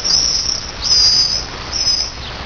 BIRD Swifts

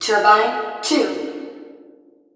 TURBINE; VOICES; COMPUTER

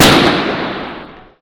bang; Freeciv

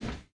metal; The Sum of All Fears

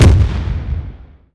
Tank Gun-Medium; 1944 Battle Of The Bulge

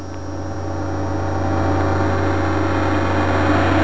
grow

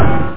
glonk; Aminet 21; GTI - Schatztruhe; Amiga 8-bit Sampled Voice; 8,363 sample rate

